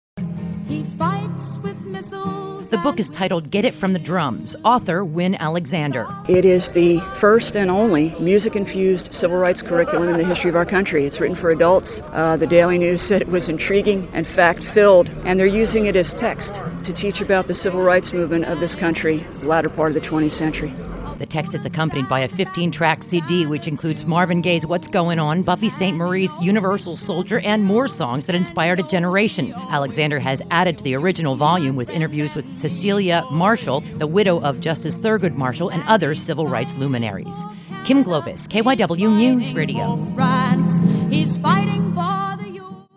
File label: Radio Drums; KYW